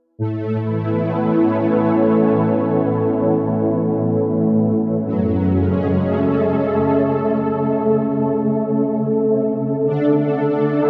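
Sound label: Headrush Synth Lush Pad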